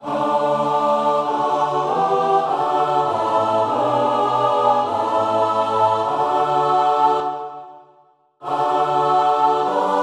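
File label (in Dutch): Geneefse psalm, soprano alto tenor bass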